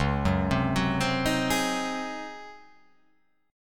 Csharp Major 7th Suspended 2nd Suspended 4th Csharp down, chord